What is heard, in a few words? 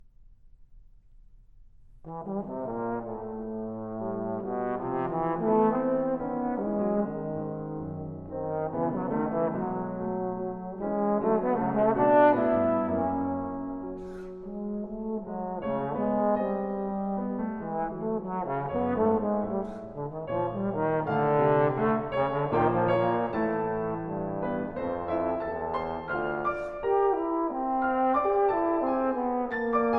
Sonata for Clarinet & Piano - Mvt. 1. Grazioso